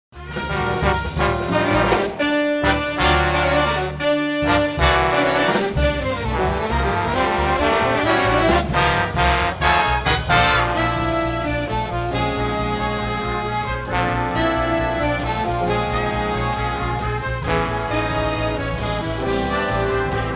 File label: Jazz in g minor